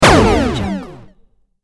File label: laser shot